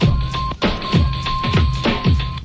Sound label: 98 classic 808 agogo rap, drum loops and breaks; 091 to 100 bpm